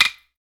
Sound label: Sticks; SB Sticks